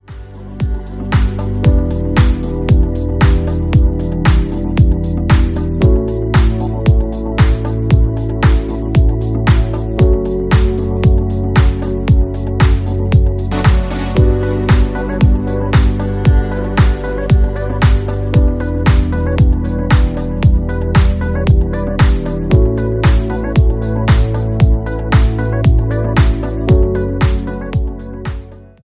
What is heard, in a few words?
flowing ringtone; samsung ringtones